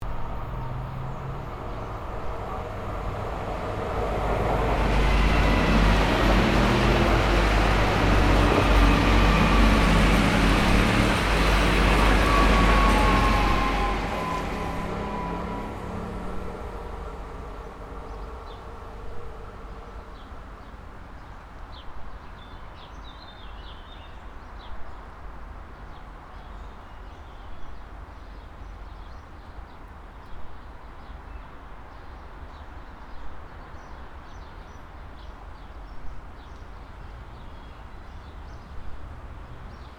train jardin; nature bord route

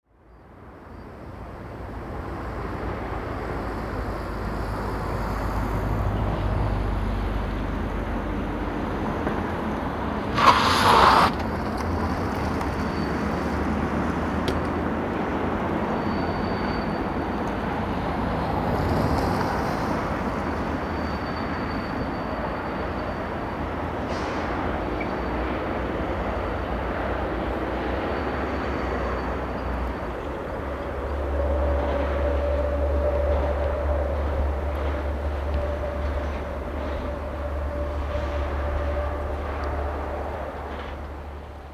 parking dominant

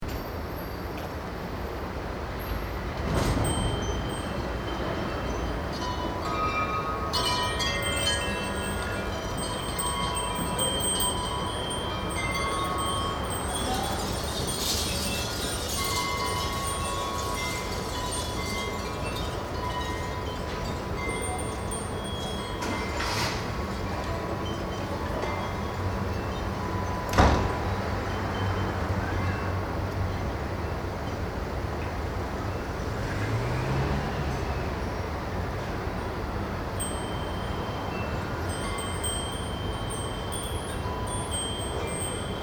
carrillons

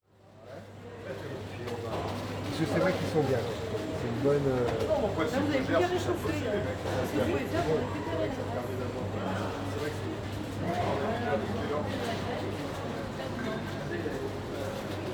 Allee centrale; Metabole

